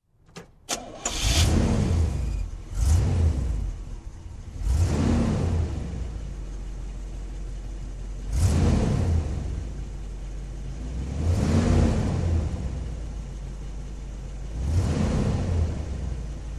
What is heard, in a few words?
Truck engine ignition and running